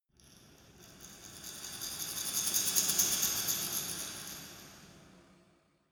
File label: dark resonator rattle dynamic